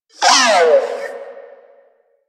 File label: Repair Drone Death; Warframe; Necramech Repair Drone